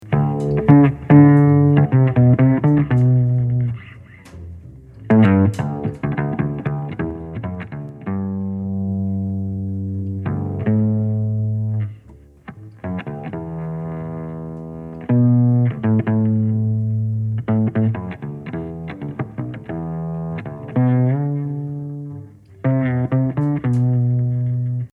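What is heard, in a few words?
Stico samples Pizzicato, electric upright bass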